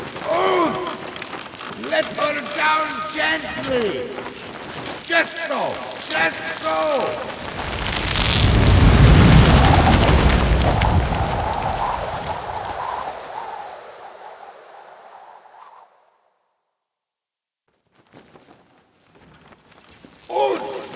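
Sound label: Balloon; Warframe; Cinematics; Eidolon Plains; Cetus Intro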